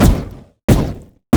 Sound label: basicattack; LoL; annie base